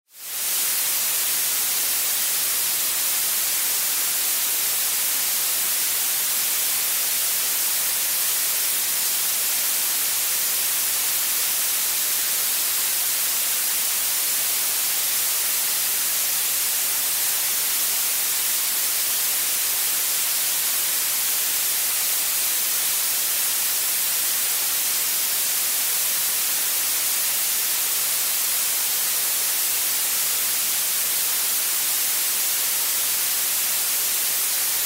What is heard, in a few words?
Blue Noise